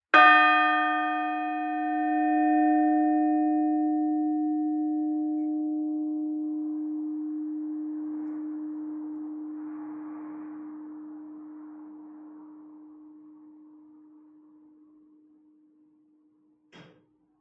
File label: bells, fairwarp